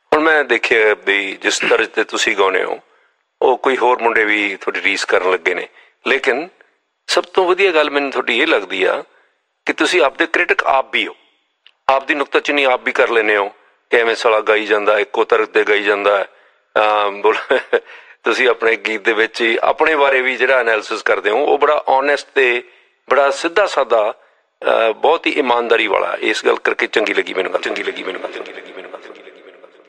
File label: punjabi song